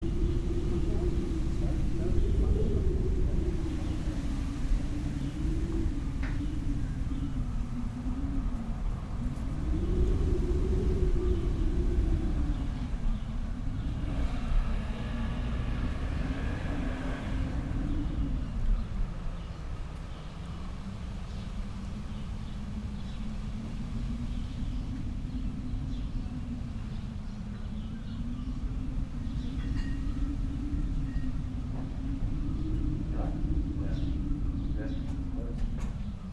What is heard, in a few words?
indoor